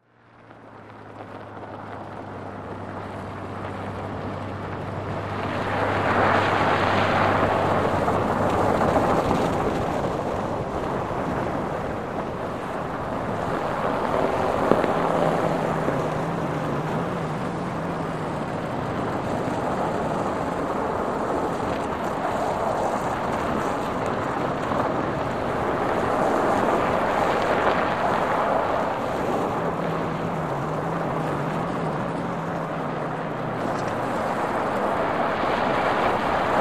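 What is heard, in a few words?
Car Slow By Snow Crunch, Quiet, Creaky Movement Rolling On Crunchy S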